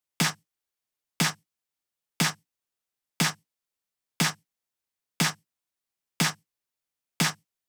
Snare, 120bpm D; Zero-G; Nu Disco; Happy People

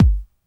KIT, Dance Mega Drums; Best Service

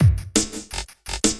LOOP, Drum & Bass, 170 BPM; Ueberschall; Drum & Bass Resonance

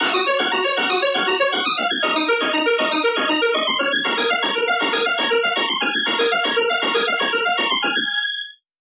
hyperpop war string; lead arp chord melody

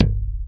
Bass Chapman Stick; Roland LCDP02 Guitar and Bass

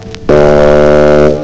saxophone; Amiga Soundtracker Sample Packs

